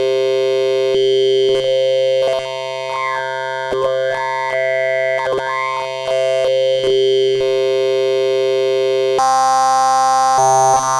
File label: cyclebox table waveform sweeps ccw